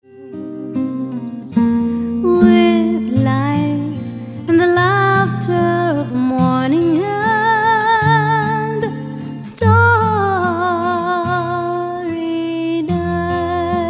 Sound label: lullaby